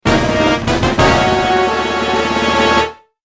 Incoming Fanfare; Zelda

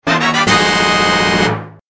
Treasure Fanfare; Zelda

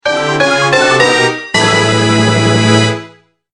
Discovery Fanfare; Zelda

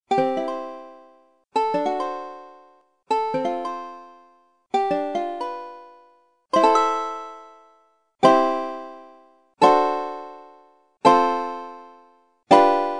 Island Game Chords Medley; Zelda

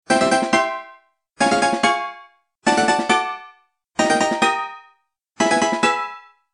Checkpoint Fanfare Medley; Zelda

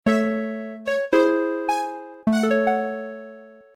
Lost Game Fanfare; Zelda

